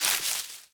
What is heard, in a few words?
Leaf litter step; Ściółka